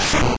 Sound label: Triple Kick; attack moves; pokemon; gen 2; gsc